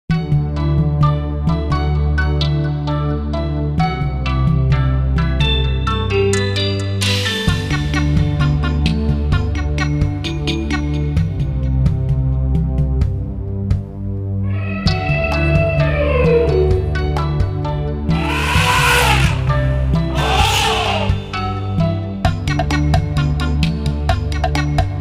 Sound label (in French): VERSION KARAOKE; Alex et Zoé 3